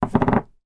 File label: industrial masking tape roll place down on table